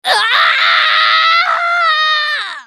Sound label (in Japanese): スカウト女 死亡